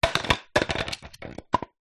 household water sprayer bottle plastic small knock over garage floor some room; reverb